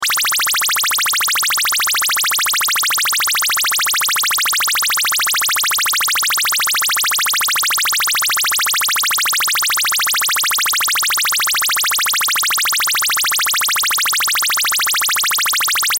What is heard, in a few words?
Sci Fi Alarm High Pitch Electronic Warning Loop; Control Panel; Blastwave